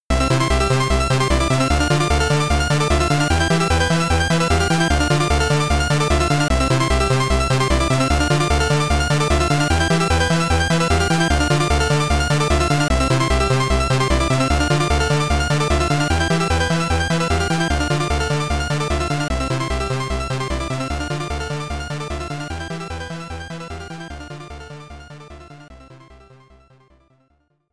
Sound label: Bonus Music; Bubble Bobble; Amiga